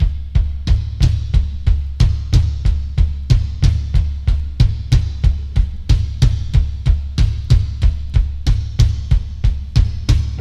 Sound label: 92 bpm drum loop D key, kick tuned to the D note